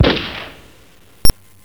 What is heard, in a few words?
gun shot